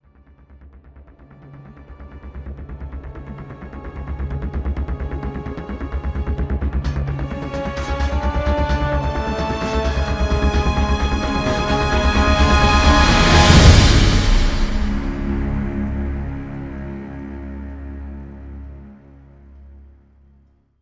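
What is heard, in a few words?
sting, slow build guitar; transformers war for cybertron gamerip; IACON INVASION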